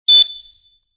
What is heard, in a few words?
molten indoor